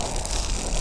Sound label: thunderbolt loop